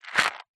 household jewelry earings new on card several pick up